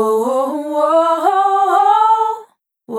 Apaga La Tele - Dry, Multi-Voice Loops, Vocal Samples; Sonic Foundry (Sony Creative Software) - Pocket Diva World Class Vocal Samples